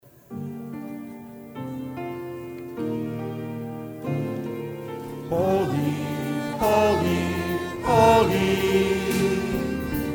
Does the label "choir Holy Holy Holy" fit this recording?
Yes